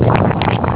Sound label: BUBBLE, BATHROOM; EFFECTS